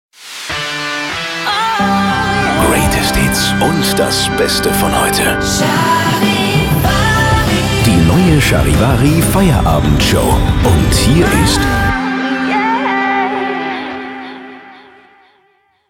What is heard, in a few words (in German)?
Show Opener Feierabendshow neutral kurz; Charivari